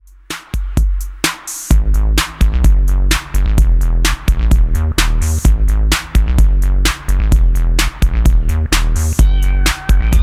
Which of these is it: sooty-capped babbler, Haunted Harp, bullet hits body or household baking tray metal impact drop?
Haunted Harp